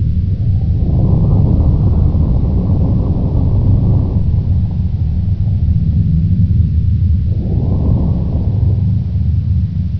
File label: rumble loop